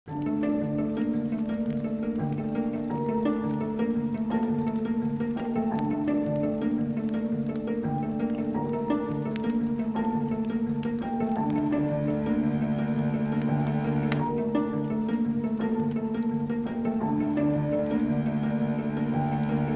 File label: Trap Orchestra